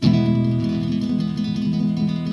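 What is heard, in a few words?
flamenco chord, guitar; Greytsounds; CLASS GUITAR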